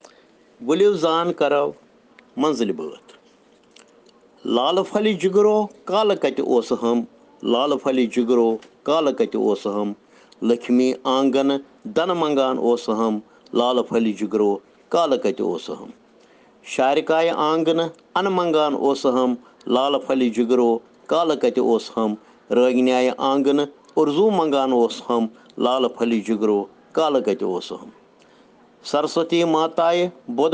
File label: Children Rhyms in Kashmiri